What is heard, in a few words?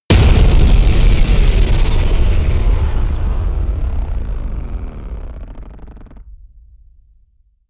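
3D SFX Trailer; مؤثرات تشويقية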